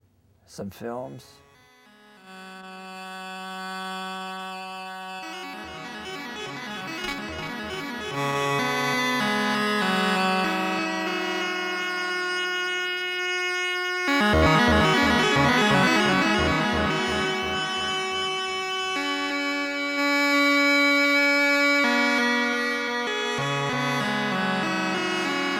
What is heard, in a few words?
Electronic Music